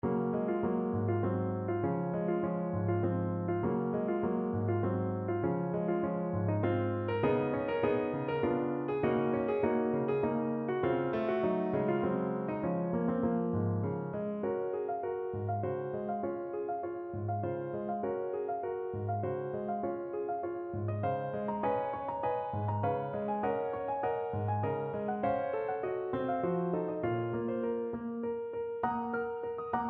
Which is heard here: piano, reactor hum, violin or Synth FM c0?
piano